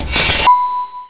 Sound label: ping